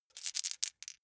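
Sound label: small four rattle in hand short, dice; wuerfel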